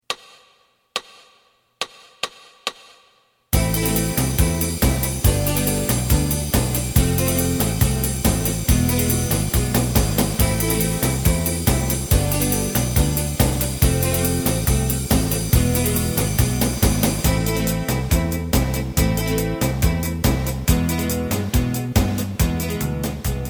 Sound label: lead